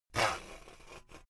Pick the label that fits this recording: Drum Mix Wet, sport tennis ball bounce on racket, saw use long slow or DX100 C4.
saw use long slow